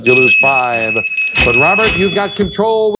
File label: cash